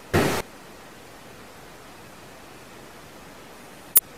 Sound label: bang then high frequency burst